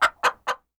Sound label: Chicken DMG; MUDevs S20.1-3